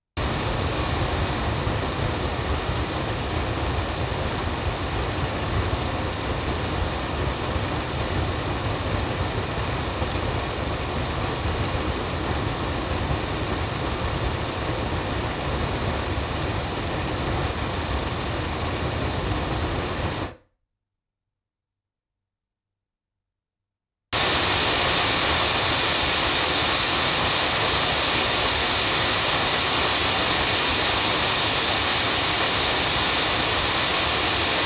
Neumann TLM170 8 loudspeakers

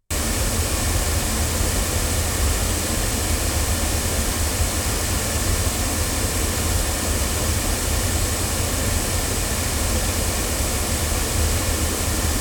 loudspeakers, microphones recordings

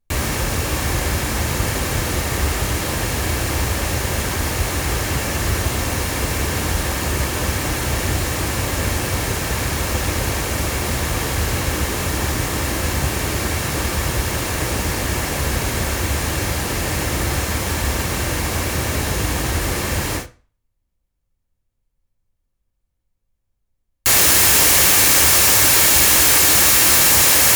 loudspeakers; LineAudio SM6i; microphones

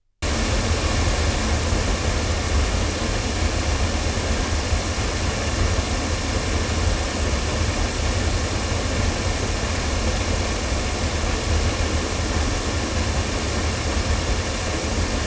Earthworks M30 loudspeakers, microphones

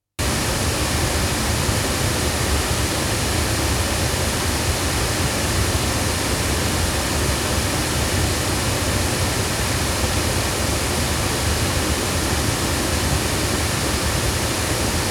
loudspeakers; CT Studio Vocalist; microphones; Crowley Tripp

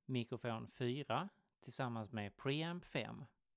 mic pre

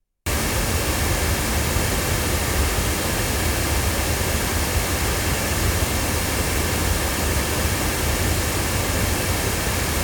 loudspeakers; LineAudio SM6i; cardioid; microphones

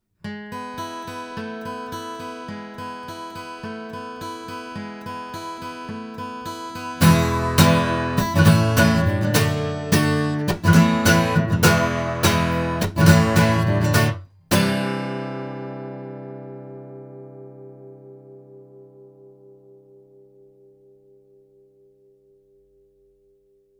AEA R84 guitar

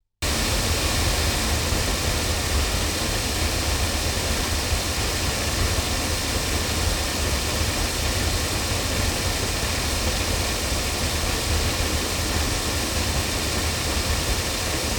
loudspeakers; Oktava MK012 LOMO M3; microphones

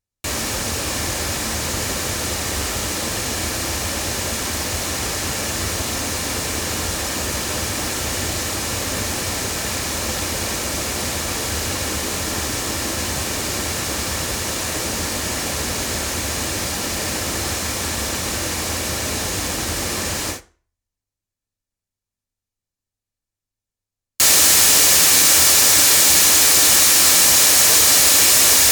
TL66 loudspeakers, microphones recordings; Pearl

